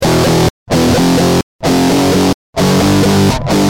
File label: G Jam, Guitar; Industrial